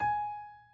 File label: key; keySounds